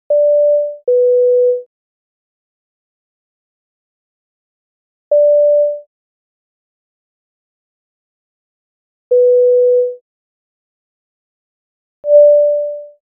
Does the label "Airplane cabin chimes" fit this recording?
Yes